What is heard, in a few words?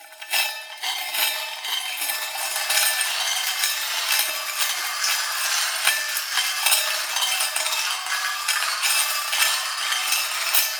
Blended Metals; Loops; Texture; Ableton Live 12 Lite; Core Library